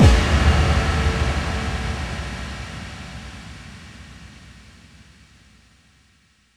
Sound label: Bassdrums FX, FX Kicks; Vengeance Samples